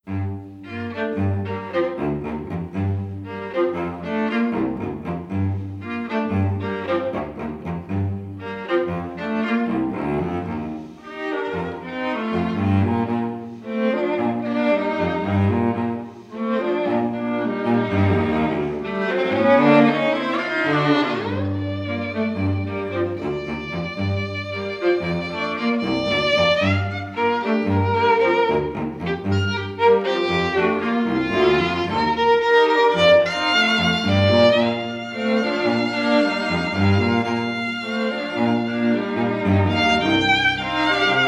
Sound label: String Quartet Swing Low Moving Easy